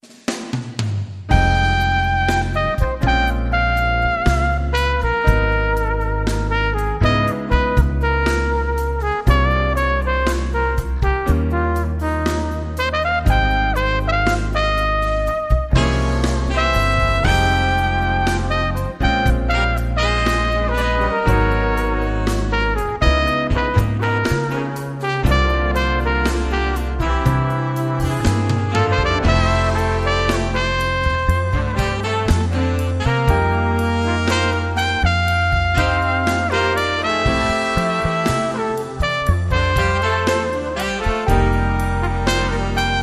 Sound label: Romantic Brass